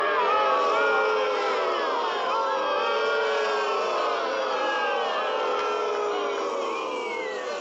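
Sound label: boooooo crowd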